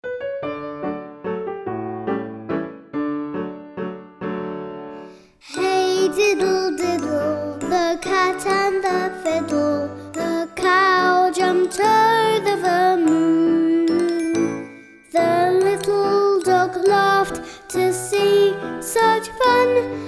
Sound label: diddle